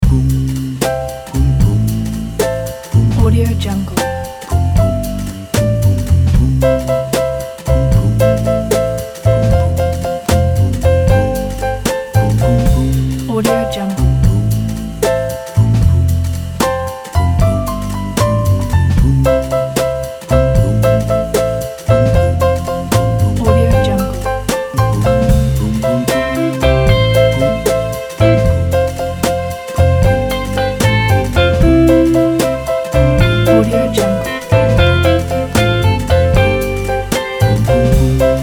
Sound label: light jazz